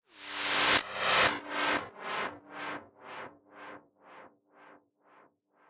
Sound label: Swept Noise